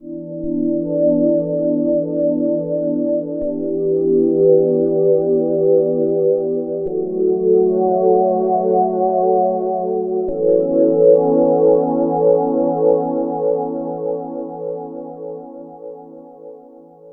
Pha EZ Pad; TAL-Pha; AFX

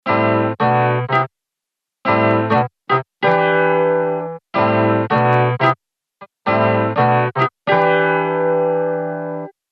Mellotron M4000D MKII Harpsichord

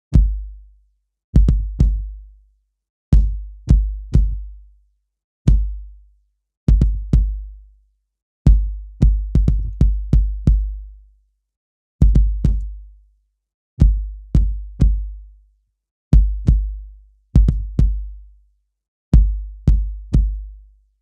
Cardboard Kick Drive

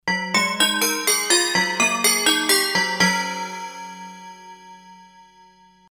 East Bell

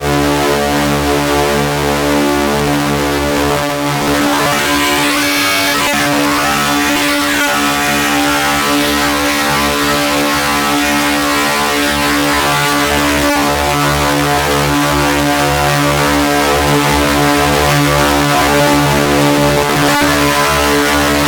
Waverazor Dual Oscillator Swarm Mayhem; MOK

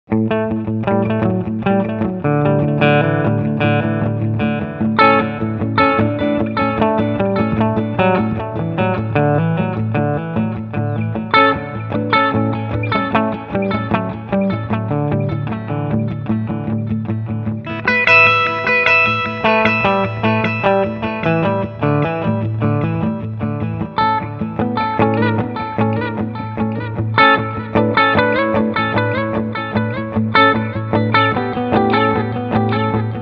delay

